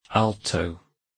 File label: alto